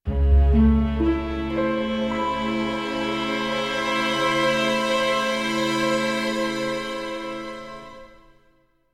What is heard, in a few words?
suspence short; Chrome